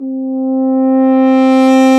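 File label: BRS F HRN, French Horn Swell; Roland L-CDX-03 Disk 2; F.Horn FX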